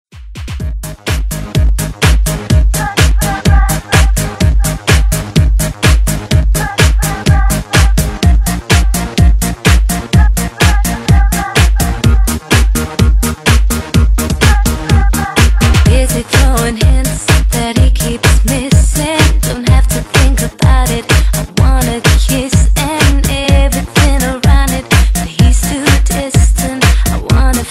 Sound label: Dance Redrum